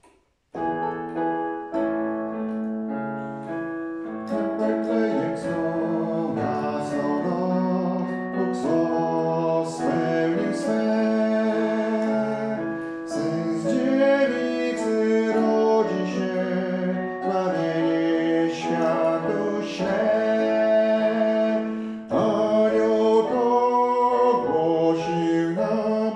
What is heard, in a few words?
wtebetl bas